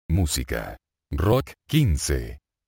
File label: rock